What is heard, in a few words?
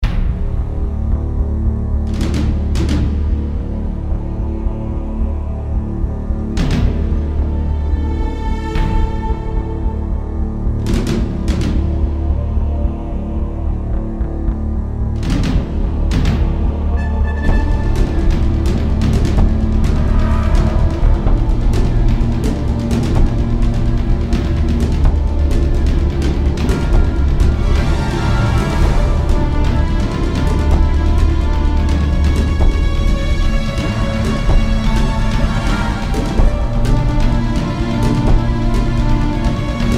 Music background pads